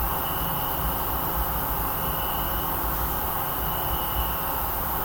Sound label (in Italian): fruscio